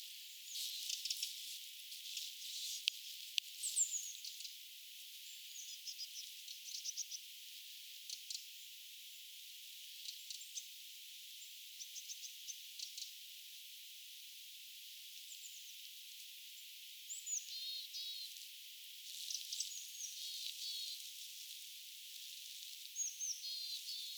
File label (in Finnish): pikkulintuja saaressa